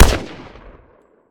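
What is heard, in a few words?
weapon ar fire player atmo exterior; gmod; viper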